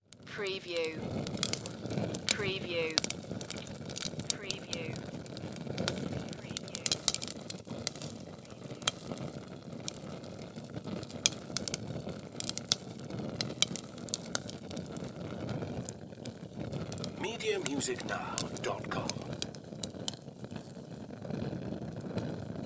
Sterno Flame Large

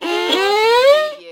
Cartoon Violin

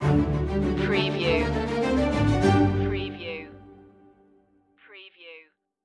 the storm is coming stinger

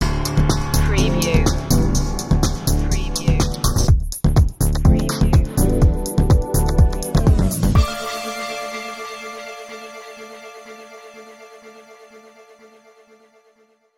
sting

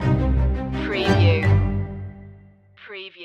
stirring times stinger

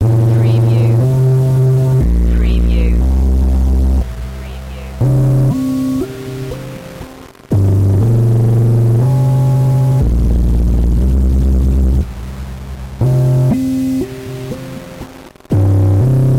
Long-n-Evil

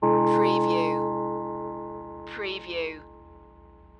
Gong Mysterious

